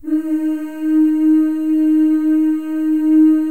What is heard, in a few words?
FEM, Symphony of Voices; Spectrasonics